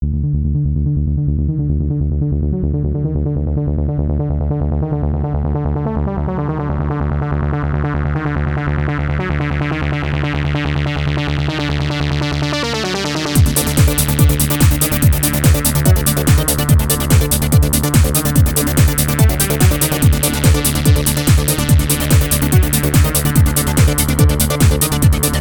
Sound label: Race BGM; Coronel Indoor Kartracing; windows